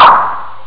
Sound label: snap; Amiga Soundtracker Sample Packs